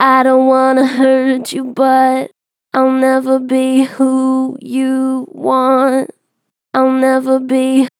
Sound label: Strong Vibrato, Natural Vibrato Strong, Vocals; I dont wanna hurt you; Harmor Vocals